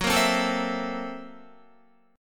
F sharp 7th Sharp 9th Flat 5th F sharp down Guitar; strummed; JGuitar